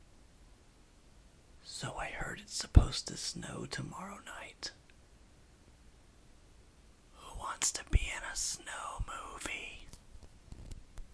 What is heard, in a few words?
Hmmmm